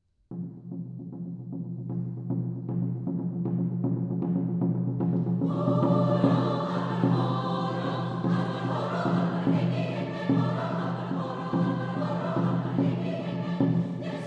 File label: Mouth Music